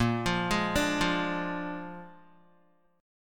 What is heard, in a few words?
Bb 7th Bb down Guitar 4F,4A,5C,5G,5C,6E; arpeggiated; JGuitar